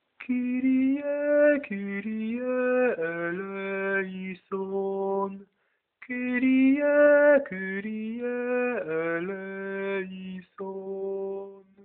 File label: Kyrie sopran